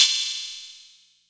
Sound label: Metal